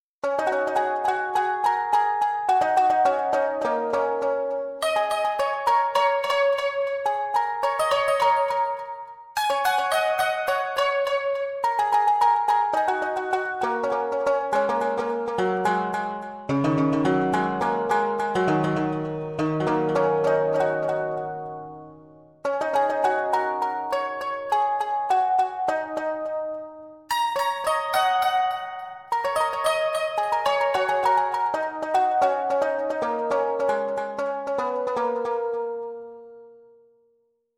Deluxe Koto

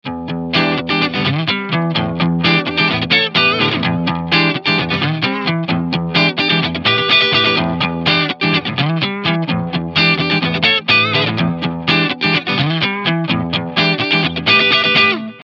Blues Rhythm Clean

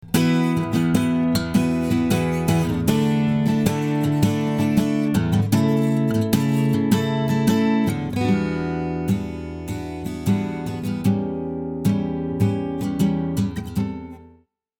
Acoustic Gtr Fairchild 670

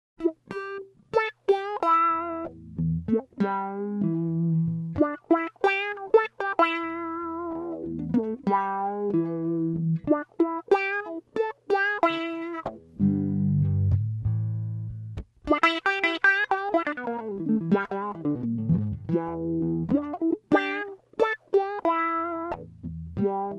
Goove Line Tube Zipper

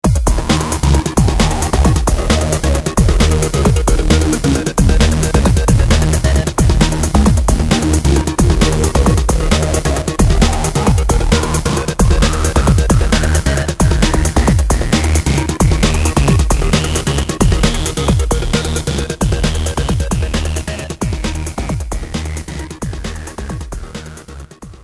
EMX Techno

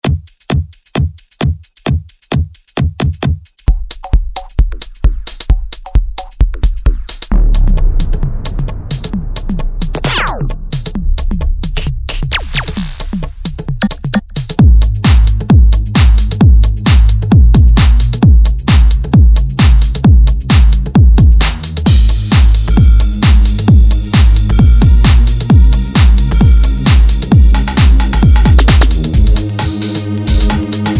kit

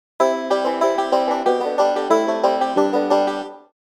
banjo

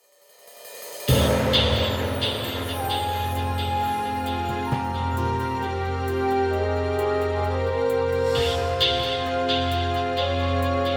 Kontakt 2 Floating World; NIN KONTAKT MAC

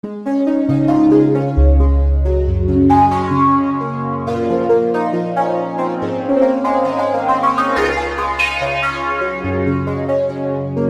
nord wave santur

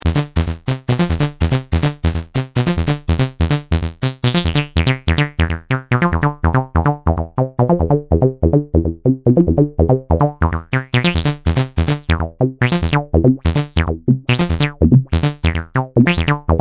BASSLINE

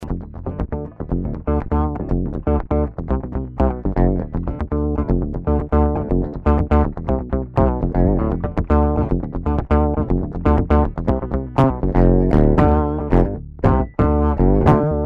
Octave metal

